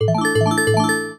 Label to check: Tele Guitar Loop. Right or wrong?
Wrong